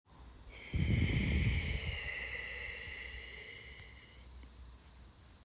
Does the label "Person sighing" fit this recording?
Yes